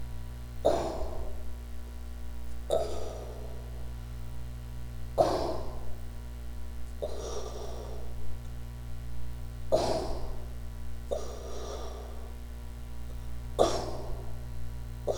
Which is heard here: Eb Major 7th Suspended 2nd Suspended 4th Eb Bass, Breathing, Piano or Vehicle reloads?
Breathing